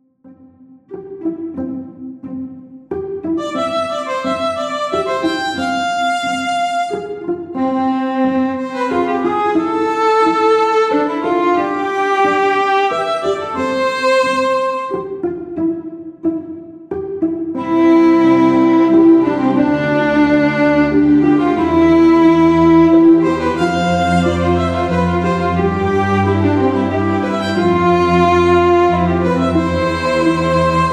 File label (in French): VIOLON